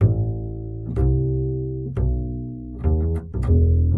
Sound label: bass, Loops